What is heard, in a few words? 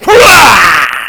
huwaaaa; Jailbreak 1.01